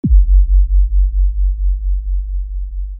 Sub Bass; baixos

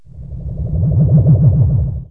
med throb, space, strange; KABOOM! - Wild and Wacky Sound Software

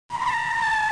SLIP; SRALLY

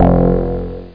Wow Bass; Prince of Persia 2 Demo

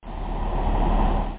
Aslide1; Evolutionary War 1.0

